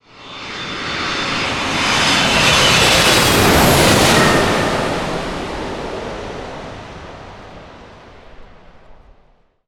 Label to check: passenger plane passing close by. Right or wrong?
Right